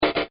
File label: BEEP, Beeps; Objects; Partners In Rhyme